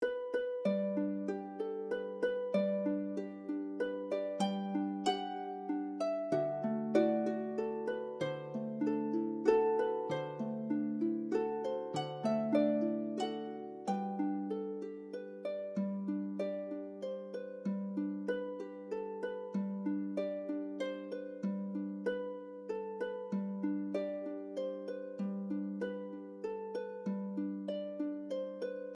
Jingle Shark Sleep Loop; super rub a dub; ps3

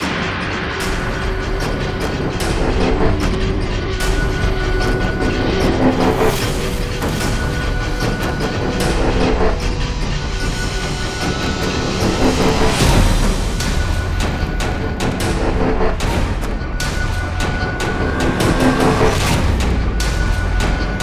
Base Loop low

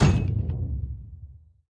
Bounce Metal Heavy

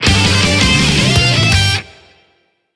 JINGLE power up; beyblade x xone

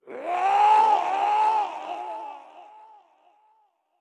Yell; Rabblerouser

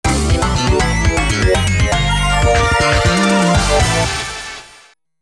Surgery Success; Trauma Center: Under the Knife 2

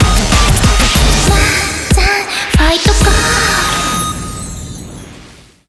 BGM Reach

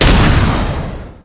Enemy Fashion Damage Shot; disney star smash android ios mobile gamerip 2020

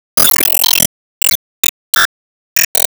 Sys Scrbtlresult Rank; Sys Scrbtlresult Rank 2nd

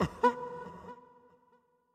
Toxic Mask Short Laugh

